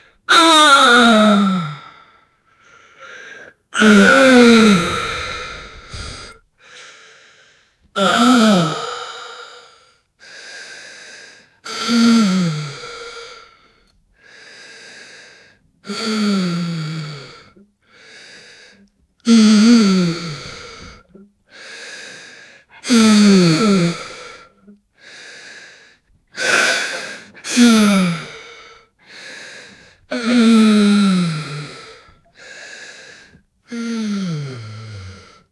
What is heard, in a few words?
years old man groaning; 18 years old man; suffering